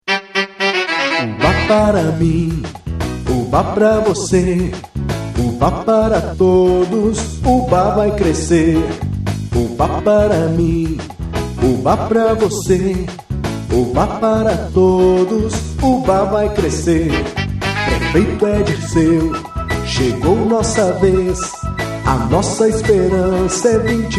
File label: jingle; dirceu prefeito; uba